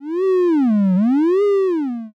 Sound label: metal strain